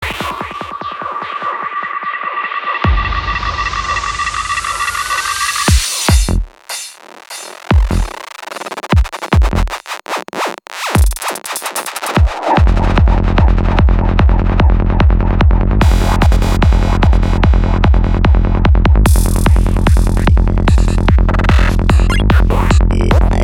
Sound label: music